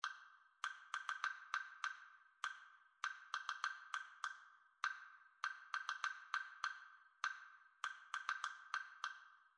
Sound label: woodblock; composing at home